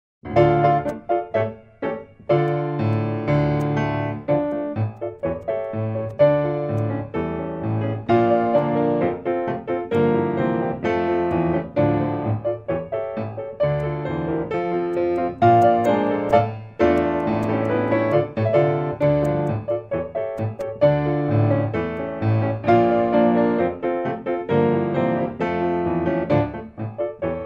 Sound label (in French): grand battement